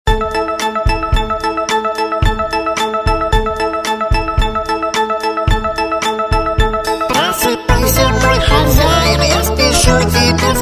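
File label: caring alarm clock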